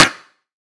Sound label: claps, dance drum kit; 99Sounds x Monosounds; Twilight